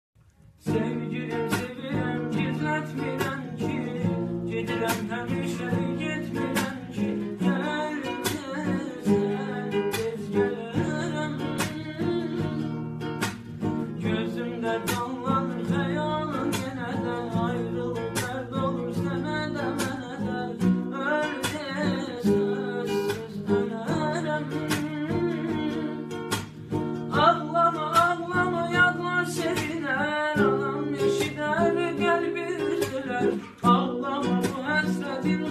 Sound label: gitar cover